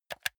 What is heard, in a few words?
console controller r1 press fast hard